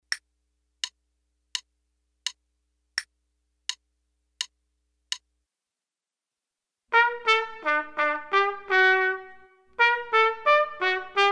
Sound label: trumpet 2, Allemande; trumpet play alongs; Selected Duets for Trumpet, Page 45 Number 11, Allemande, performed by David Summer.; Trumpet 2 Only